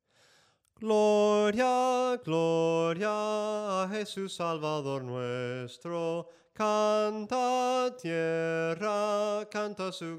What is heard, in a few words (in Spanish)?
SOPRANO CANTADO, himnos en melodia soprano